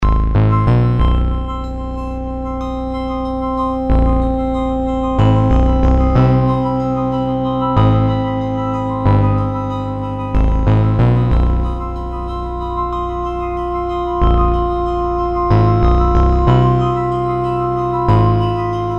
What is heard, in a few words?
Darkness No Drums; Chuck's Zombie Petting Zoo; Marr0w Productions